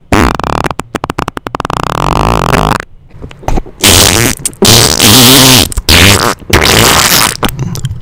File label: loud farts